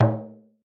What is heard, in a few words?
Rebana